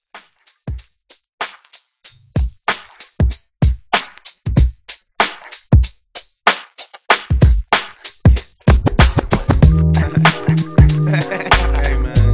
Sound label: 90s Old School Edit, 95